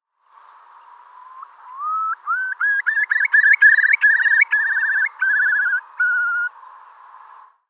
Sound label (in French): courlis cendre